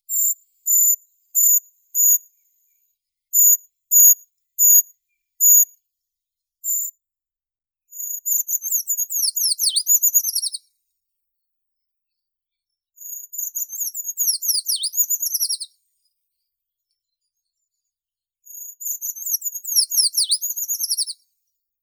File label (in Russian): pishuha obiknovennaya certhia familiaris; zvuki prirodi